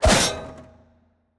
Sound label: Dracosoldier Shield; Legend of Keepers